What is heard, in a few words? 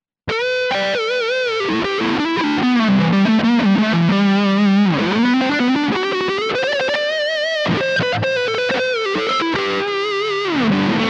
Epiphone Highgain Lead